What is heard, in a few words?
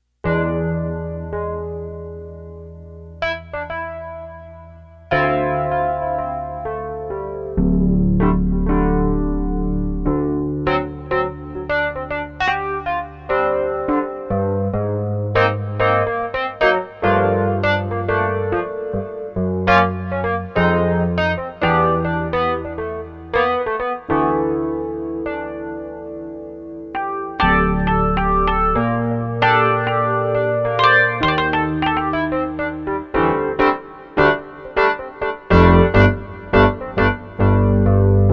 piano rhodes; dss1 demos